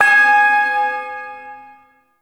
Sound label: Shawzin Oct Two G Sharp; Music; Warframe; Duviri; Ersatz Shawzin